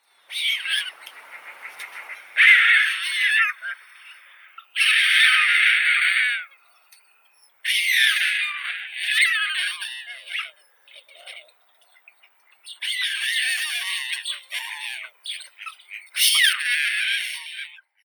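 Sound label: bird voices, white stork, Ciconia ciconia, chick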